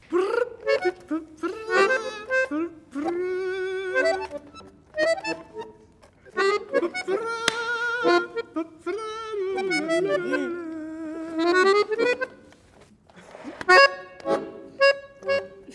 le chanteur

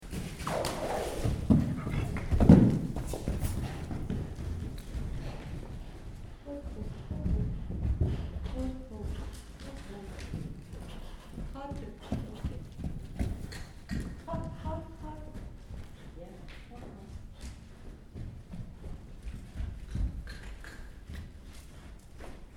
echauffement cheval

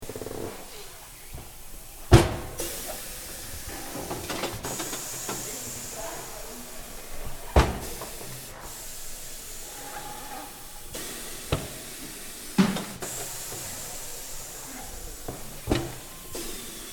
garage

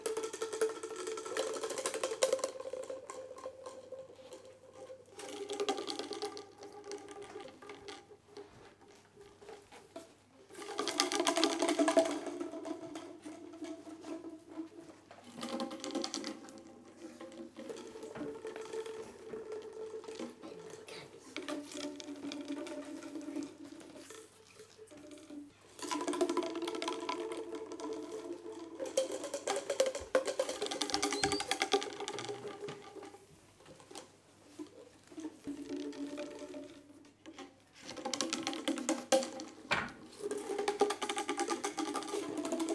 tubes roulés

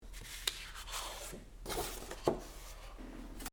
page tourne

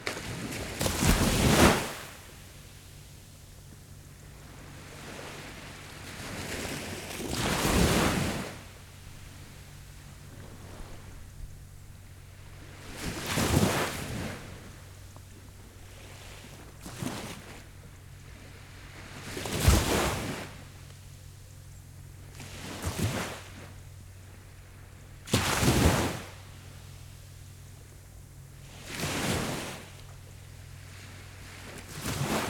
mer epaisse sable prox; fabregas

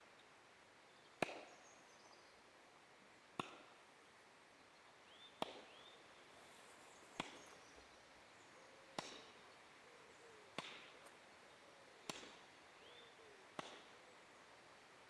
hache loin rio; left eq; arbucies

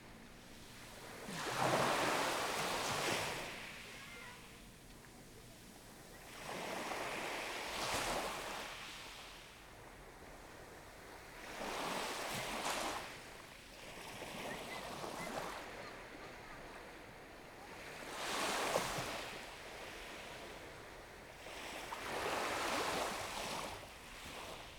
plage pin d galle; neumann KM140

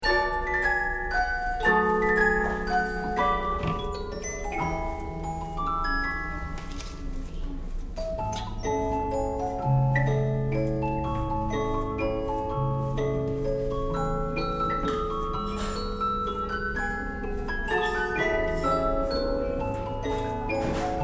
boite a musik suisse

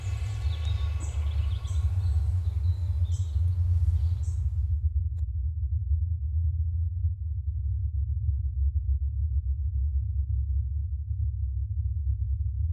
grave souffle

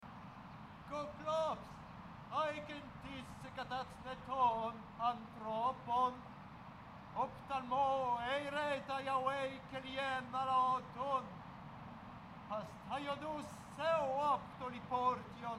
Ulysse Cyclope mer; Le retour d'Ulysse

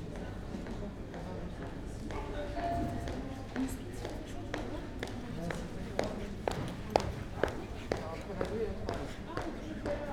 pas salle attente consult; Avec vues sur terrasses

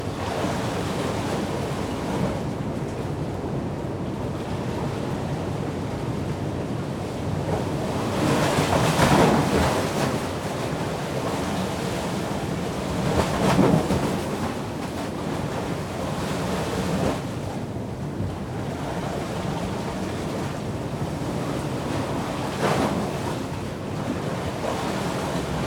mer faille; sauzaie; Ortf KM140

